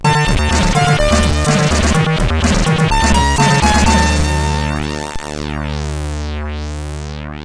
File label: Jingles, sid CSG8580R5; Freeway 86